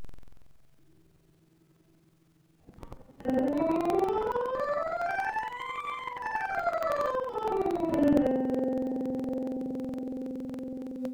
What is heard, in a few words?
C scale 2 shift